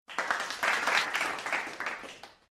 short applause

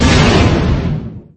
thermal detonator explode; lego star wars

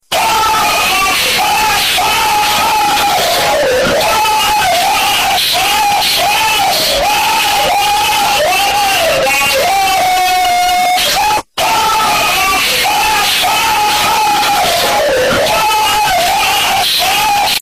ahh ahh ahh

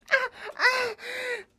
mmmm

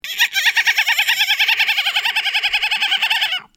dolphin

